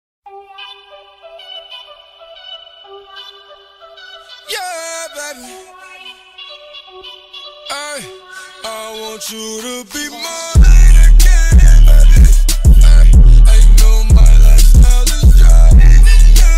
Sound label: JBL Speaker Version Bass Boosted